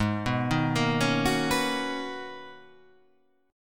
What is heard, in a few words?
G sharp Minor 11th G sharp, chord